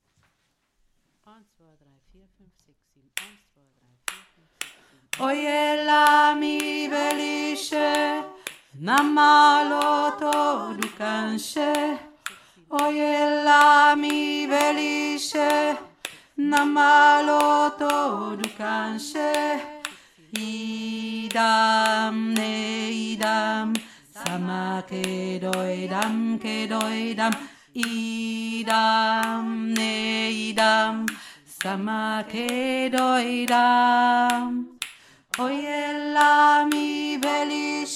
oy ela mi veliche tiefe stimme

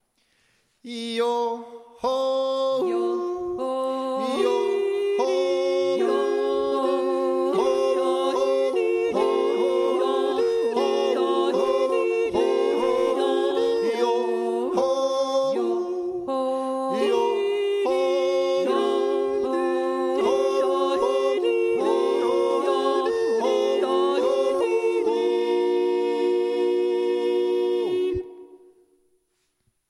joh ou 4stimmig, jodler

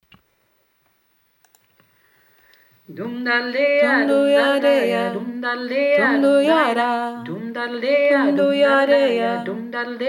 dr seinige 2 stimme

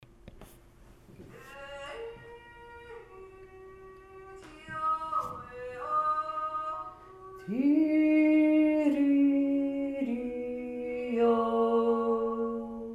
langenwang tiefe stimme, jodeln

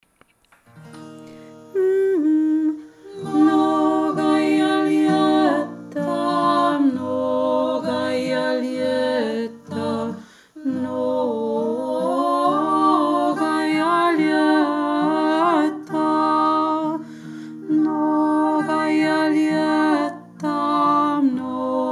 mnogaja lietta tiefe stimme

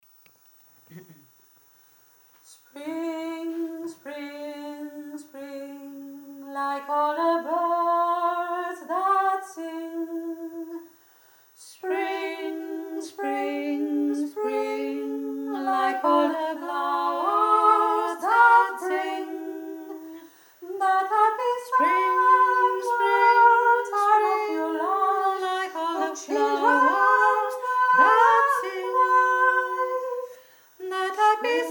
spring kanon

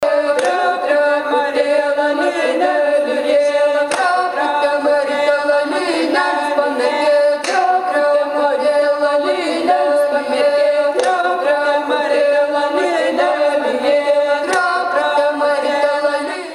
trapute litauen

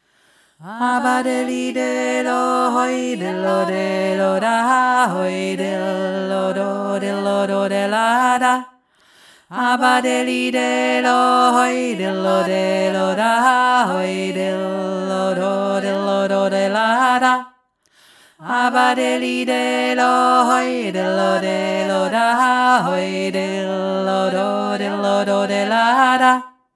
tiefe stimme

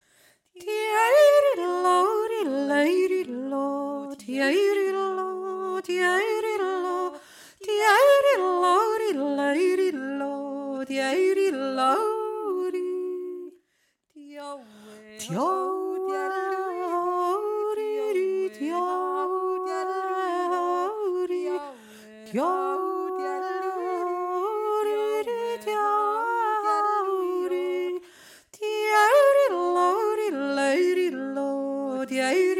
jodldaidai obere stimme

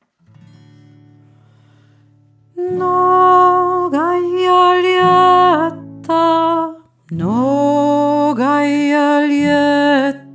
mnogaja lietta hauptstimme

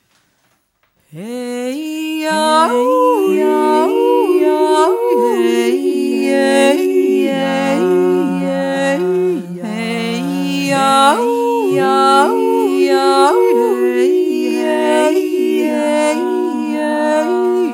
eissch tzenjodler kanon

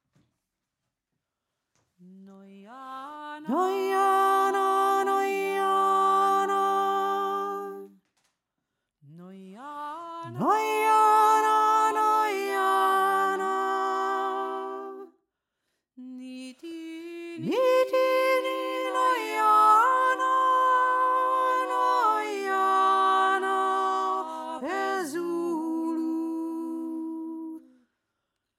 hohe stimme

